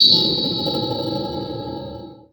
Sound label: suspense, special FX twisted; USB Soundscan; AKAI